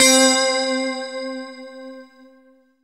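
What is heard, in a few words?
synthesizer clear, digital; Sound & Vision - Gigapack I CD 2 (Roland)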